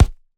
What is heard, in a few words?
Punching Percussion, Straight Glove, raw; 99Sounds